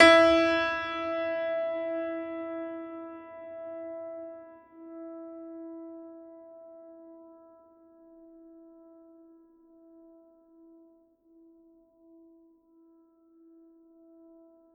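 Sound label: Classical Piano E3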